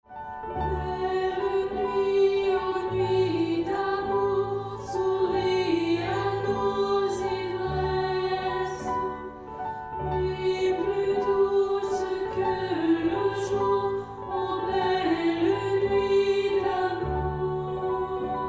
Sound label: Barcarolle